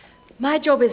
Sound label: sample, male laugh